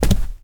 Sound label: Rug Run